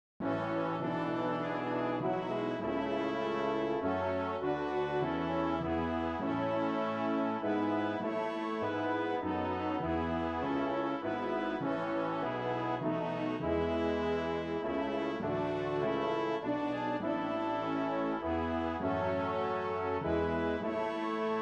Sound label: Hymn BRASS